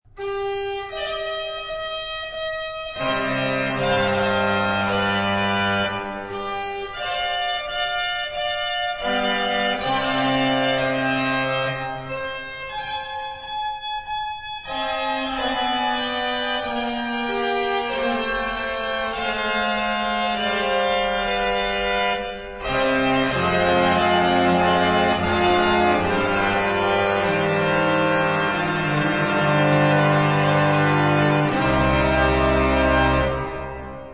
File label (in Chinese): 管風琴; 聖母頌歌1739; 由Louis Alexandre Clicquot為法國南部Houdan地區教堂所建造的。; HORTUS